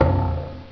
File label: snail death; the-hat-chooses-the-wizard